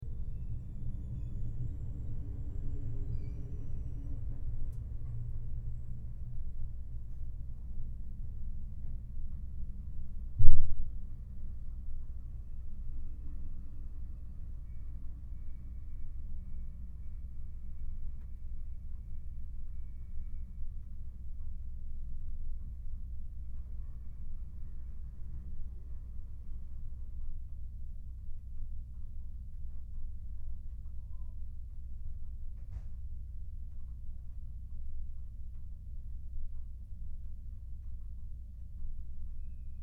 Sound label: sleeping car; jr; akebono